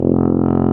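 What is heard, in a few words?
brass, C Tuba; Tubas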